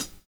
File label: HH, drums dance & groove; USB Soundscan vol.11; AKAI